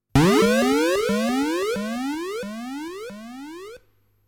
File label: Warp SFX